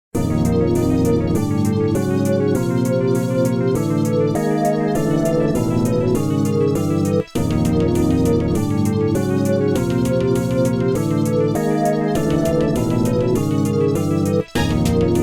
Music; NSMB; Danger, Bob-omb! Danger!

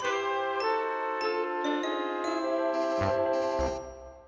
Game Over

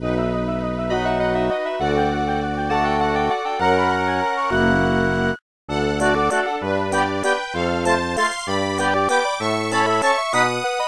Music Unknown Fanfare

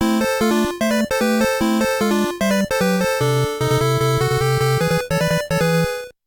Jingle; VS Pinball

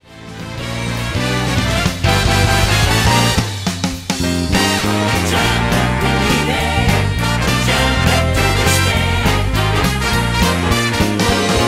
Jump Up Super Star Instrumental; SMO

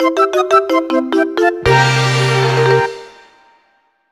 Draw!

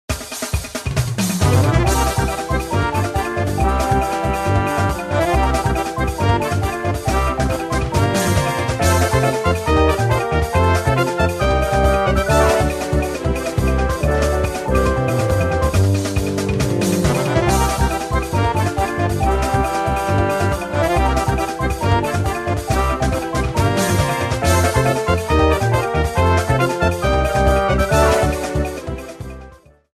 Tournament Mini Game

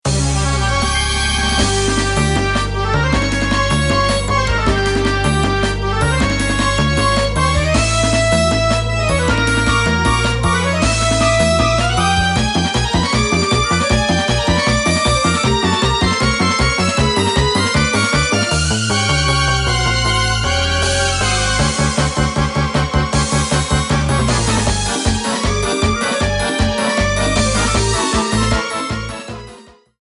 Slow and Steady; MP7

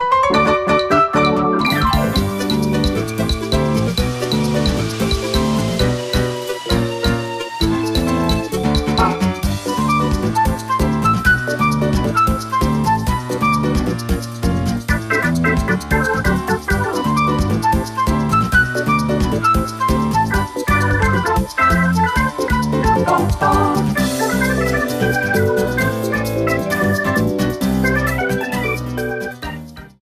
Beach Overworld; NSMBW; Coin World

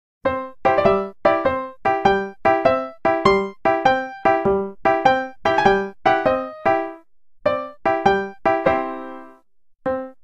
Totaka Song Piano; Luigis Mansion

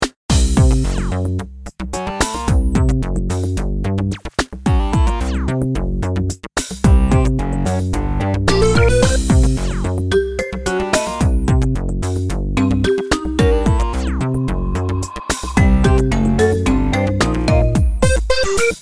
Battle with Chain Chomp!

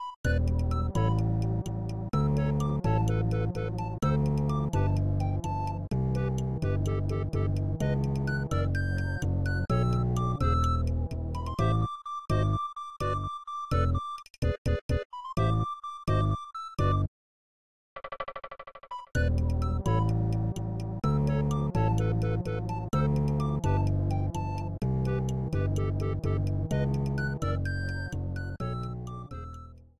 Monkey Song; Mario Paint Prototype

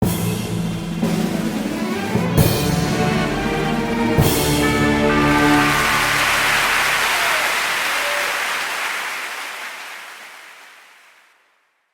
Stage Clear; Princess Peach Showtime!; Swordfighter Peach

